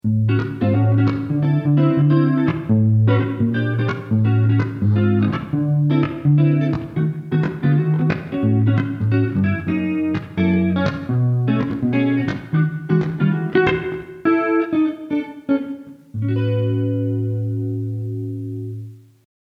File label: Jazz